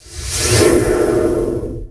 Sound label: ROCK